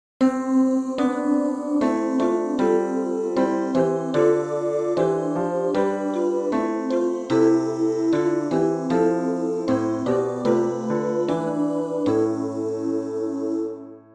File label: sol BASS